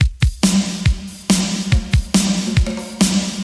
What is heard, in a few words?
140 bpm eighties punk breakbeat sample c key